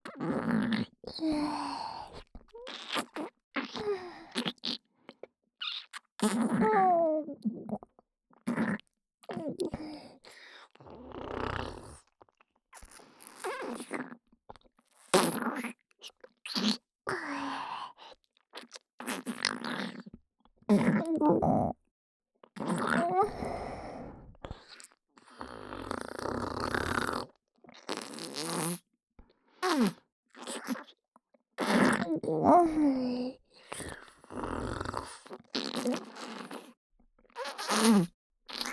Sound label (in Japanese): 無垢 ねっとり右耳フェラ; フェラ素材